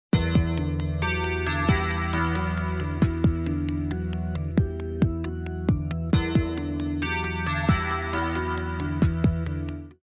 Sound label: round ten sec count; music kits custom